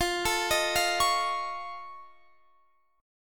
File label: F Augmented 7th F Guitar Standard, chord; F+7 arpeggiated; x,20,19,20,18,21; JGuitar